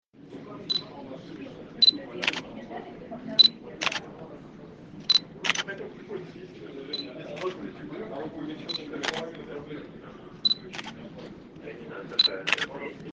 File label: x t20 shutter